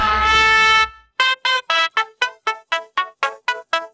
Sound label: tadaa fanfare; sound effects